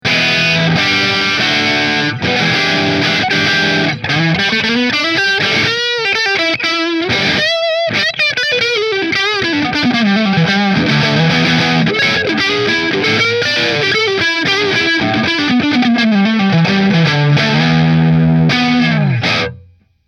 Hamer Aztec Gold Neck Marshall

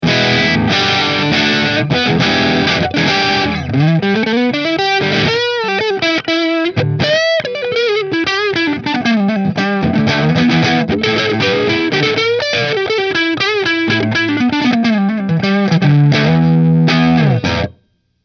Burny Neck Marshall, guitars

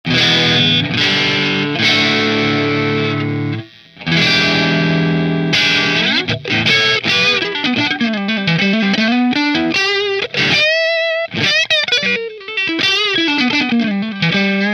Strat Position 1 Through Marshall

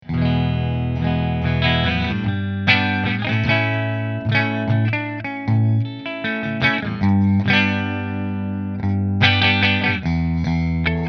McNaught Orange Middle Fender, guitars; McNaught Custom Guitars Vintage Single Cut Black Back

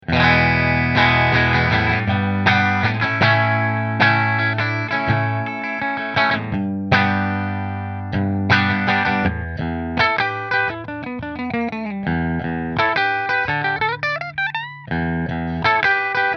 Melancon Bridge Mini Position 2 Fender, guitars

